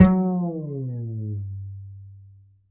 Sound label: Upright Acoustic Bass, 1ST POSITION; Larry Seyer